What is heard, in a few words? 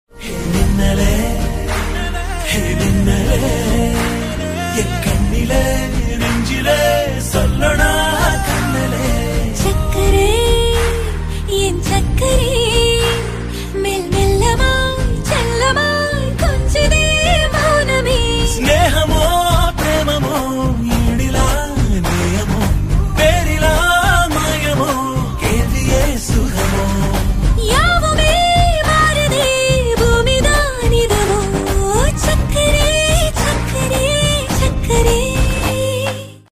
song ringtone movie bgm tamil movie ringtone; amaran; sai pallavi